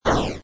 shoot; doublespeakgames

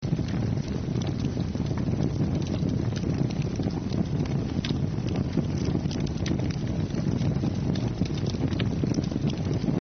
torch dungeon

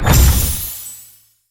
achievement big; pond5

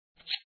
Press; coolmathgames

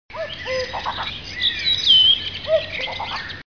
jungle Cuckoo; Puffer Popper

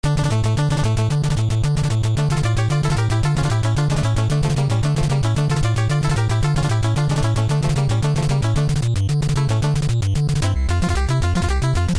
fast; firstpersontetris

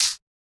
hit medium; battle; CrossCode

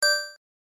collision

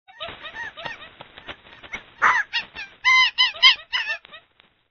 monkeys; animals; Duplo Zoo

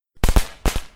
firecracker

